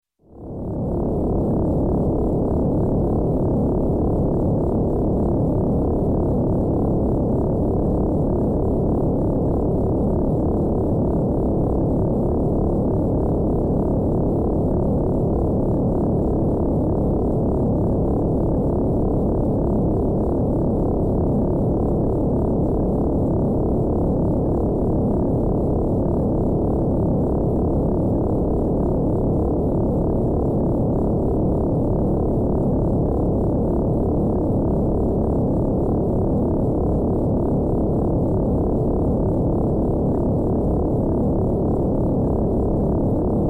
Space, Ship Space Ship, Idle, Electronic; Sound Ideas 2000 Series

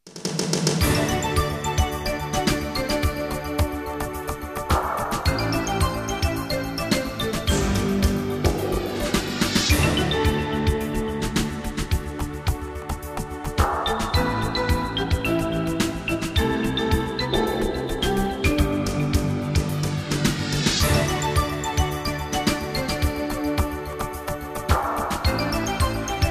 Jingles; 90 DDD Jingles

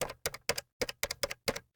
Telephone Dial; Communications; 1001 Sound Effects

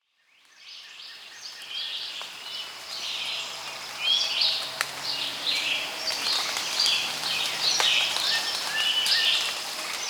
Monkey Crowd; Pitch Black Audio Pong

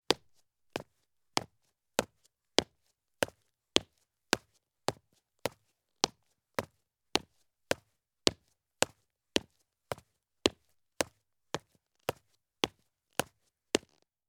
Police Boots Take Medium Steps on Concrete Stairs; Sound Ideas; Footstep Sound Effects

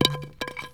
Baseball Bat Fall

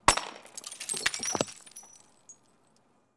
Bottle Break, impacts; 1001 Sound Effects

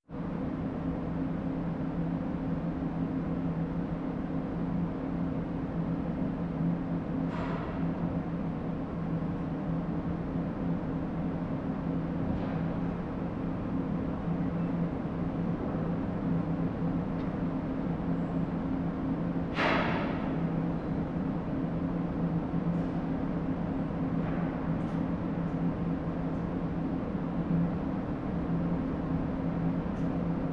Big hall machineroom; KMP Film Video Sound Effects

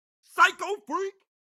car reaction; Blind Drive

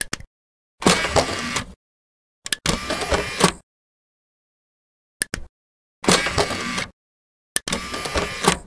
desktop computer - dvd tray open and close; devices accessories utilities; NS Studios - ultra sound collection

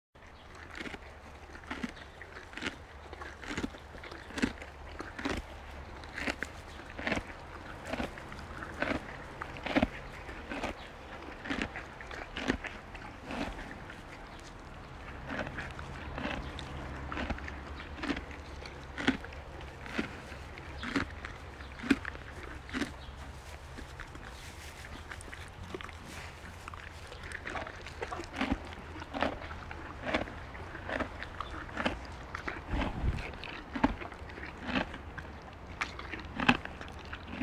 Animal effects snorts grunts farm ambience

